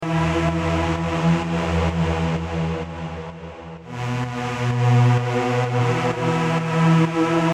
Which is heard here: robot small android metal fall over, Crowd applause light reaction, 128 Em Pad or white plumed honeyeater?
128 Em Pad